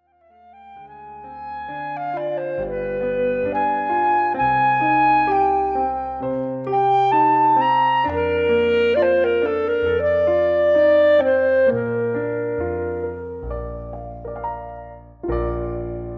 Concerto for Clarinet in Eb Major; 2nd Movt

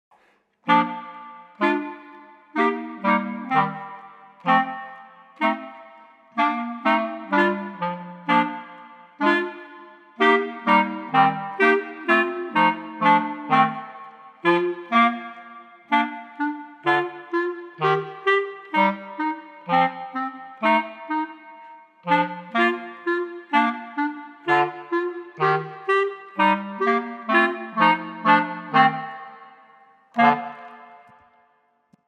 Clarinet Trio

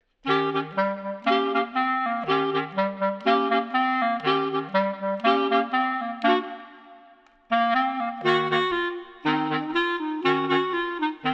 clarinet trio

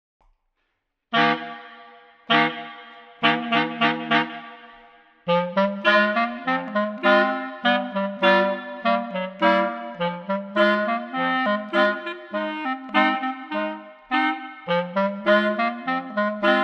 Clarinet Trio

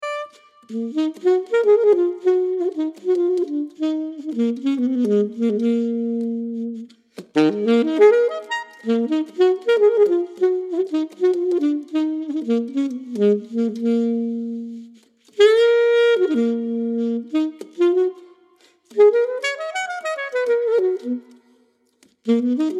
solo jazz saxophone; 3 pieces for solo jazz saxophone

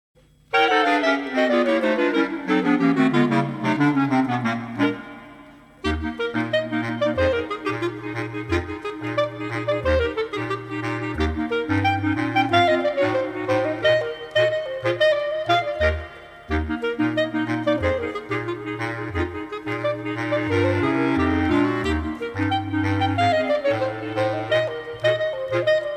clarinet quartet

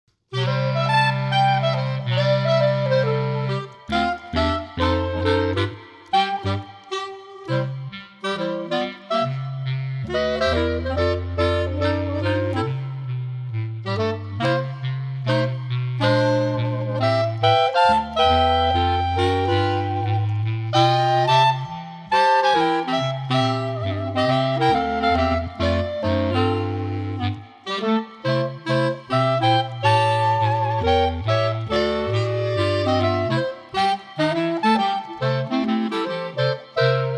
Clarinet 4tet

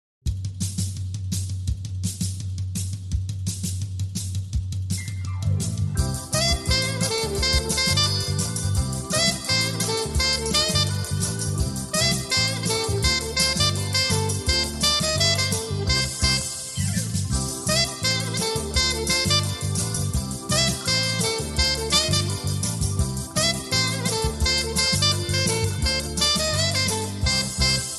ALTO

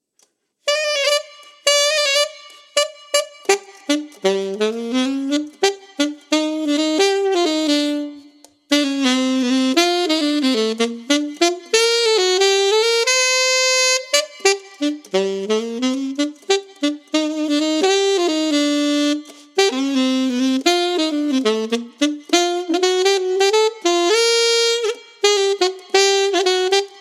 Alto Sax

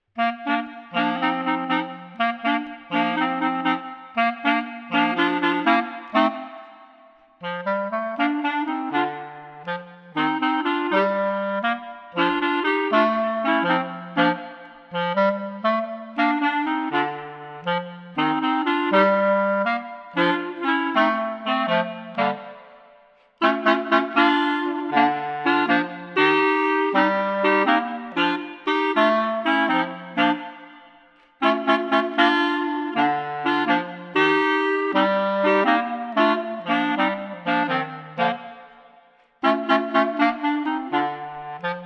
calypso clarinet trio

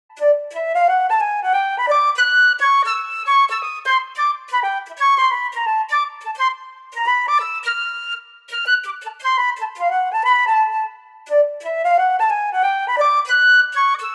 flute

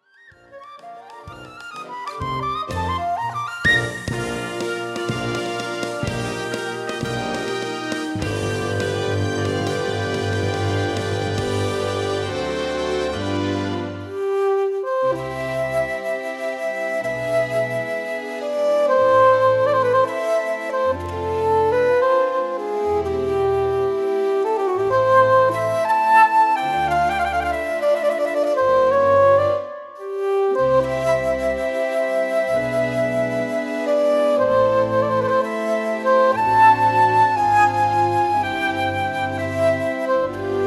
flute

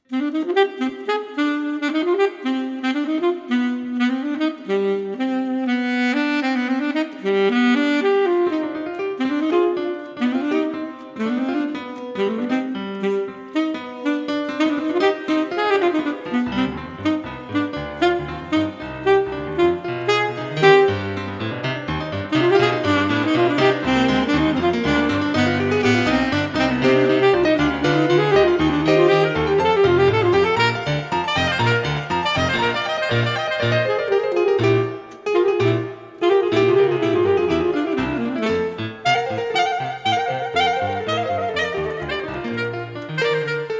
Fugue Alto; alto sax

